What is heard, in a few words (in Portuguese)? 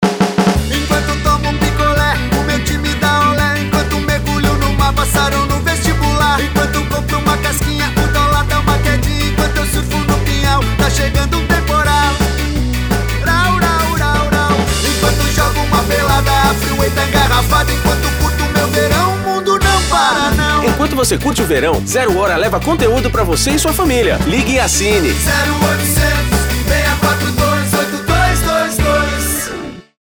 JINGLE ASSINATURA; VERAO ZARELA